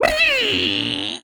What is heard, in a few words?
Pack Rat Death